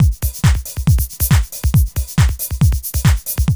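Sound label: LOOP CLAP 138 BPM; 122-146 BPM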